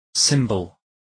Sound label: cymbal